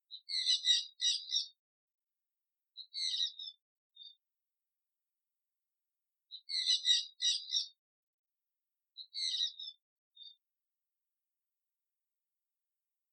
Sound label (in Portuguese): Canto da Jandaia Verdadeira